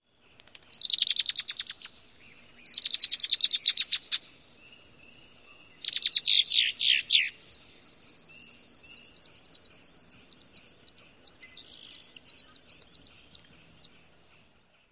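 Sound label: female chatter-songs & whimpers, Red-winged Blackbird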